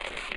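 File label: shuffle, footsteps hard surface jump up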